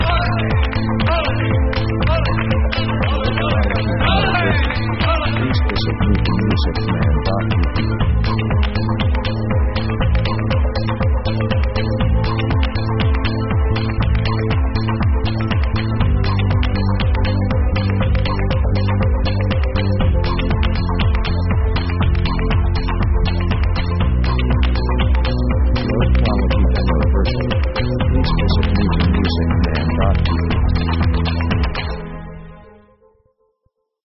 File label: pop spanish dramatic; world themes